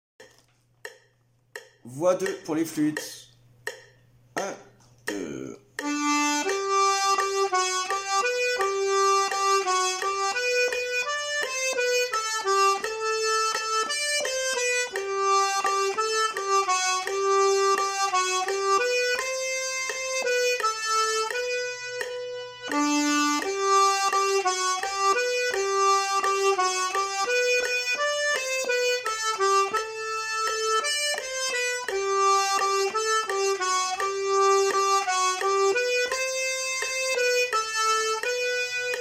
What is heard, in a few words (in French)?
inisheer flûte; Musique d-ensemble